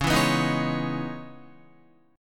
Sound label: Db Minor 9th Guitar; strummed; JGuitar